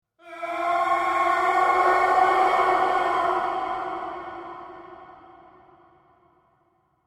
Scream Horror Surreal Scary Painful, Scream Surreal Scary Painful Heavy Reverb Terror Horror; Voice; Screaming